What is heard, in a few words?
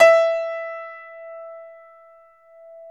E4 YAMAHA L, GRAND; NS GRAND